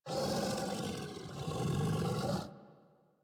sleep idle yawn, Kubrow; Enemies; Warframe; Tame

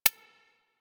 UI Common Click; Warframe

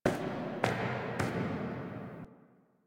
Nursery Frame Foley Pace; The War Within

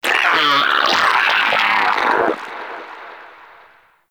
Vocal Alerted, Shrimp Lantern, Creatures; Baliq

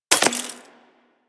Bullet Impact Debris Glass; Warframe; Weapons

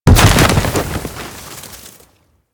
Ice Ring Spike Spawn; Enemies; Abilities; Warframe; Corpus; Arachnoid Camper Terra

